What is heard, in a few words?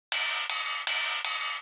Industrial 160 Ride; Drums; Loops; Drum Construction Kits; Ghosthack; Drum Mega Bundle; Drum Hero 5